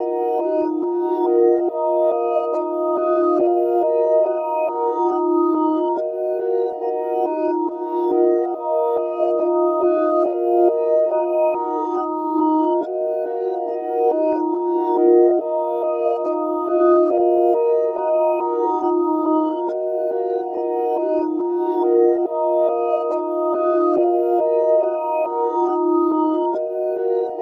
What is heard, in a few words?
140 BPM D# Min Bells, Reverse; Melody; Loop Stems; Cymatics; 2022 Melody Collection; Dreaming